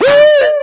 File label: alert, ministrider; source engine; episodic